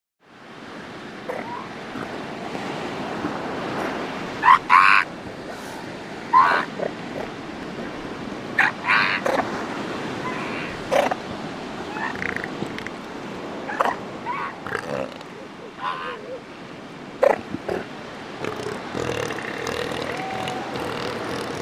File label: Seal Elephant Snort